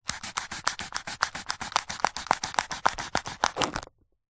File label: household plastic disposable cup cut with knife; zapsplat